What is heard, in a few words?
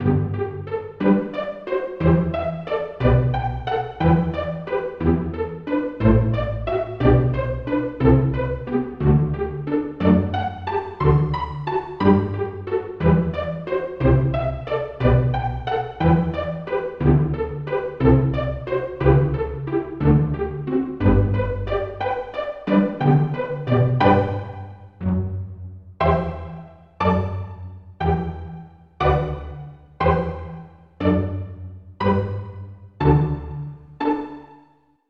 pizzicato section